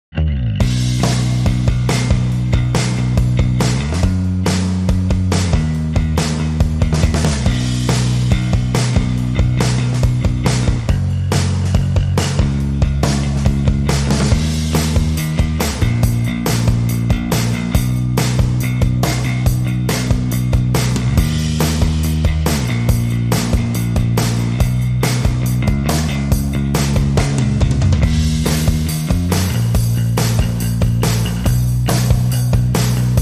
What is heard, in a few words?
Drums and Bass